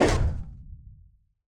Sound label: ricochet